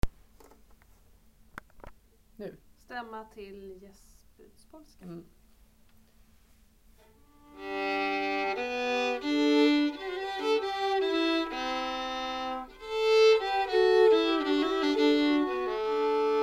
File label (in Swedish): Stämma Jäspodspolska